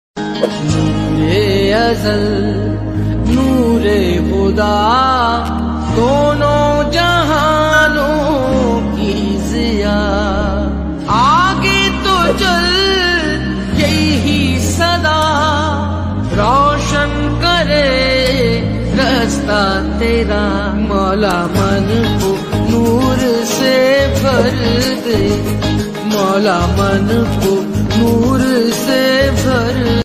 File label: Naat Ringtone